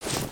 Pet Warthog Dig; creatures pets

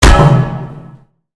Robot Get Hit Dry; creatures mechanical; Lego

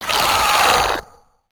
Spider Boss UP Yell; creatures mechanical

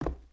Foot Wood Boot Walk; footsteps

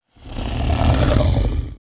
scratch, creatures organic

